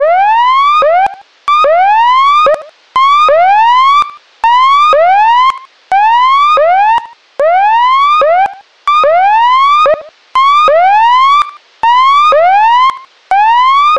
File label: warning alert siren